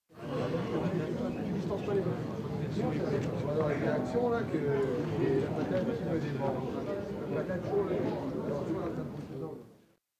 venue rustle; anemoi

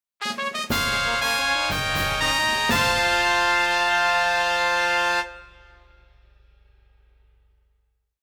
perfect win; anemoi

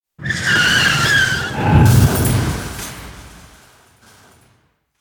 bike crash; anemoi

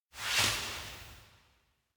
hand slip free; anemoi

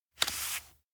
bookmark page flip; anemoi

